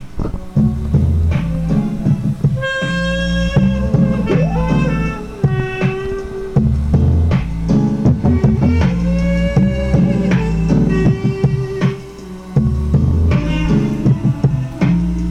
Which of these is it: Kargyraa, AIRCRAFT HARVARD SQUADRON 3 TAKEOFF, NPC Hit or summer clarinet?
summer clarinet